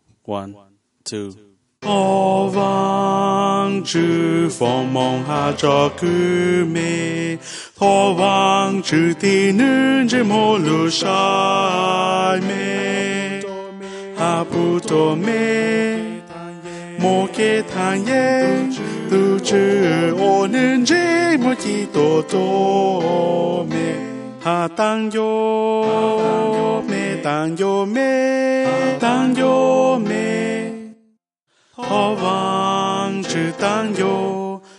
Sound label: soprano